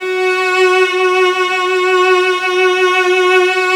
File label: Virtuoso Strings; ILIO; Kirk Hunter